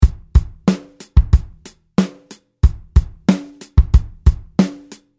Loop Vintage, Here's the drum pattern played with the vintage kit; EZdrummer